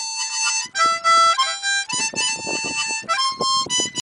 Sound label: Harmonica